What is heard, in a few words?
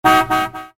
Car Horn